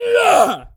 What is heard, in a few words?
voice attack male; Blind Gladiator